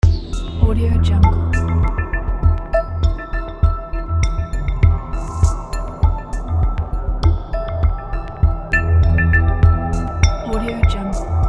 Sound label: intro tv news